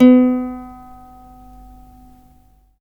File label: LUAN B3; Spectrasonics; Heart of Asia